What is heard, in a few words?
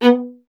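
STR VLA B1, strings viola; Miroslav Vitous; Solo Instruments VOL-1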